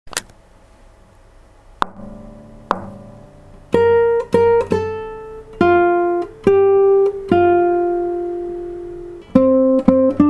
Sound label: happy, guitar